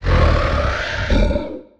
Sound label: Humanoid Long Grunt, Monster Sounds; The Decline Of Warriors; Monster Sounds Volume II